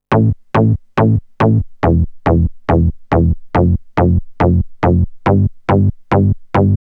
melo, party

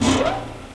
turret, tank

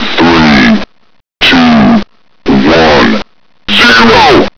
timer; tfc; timerbag

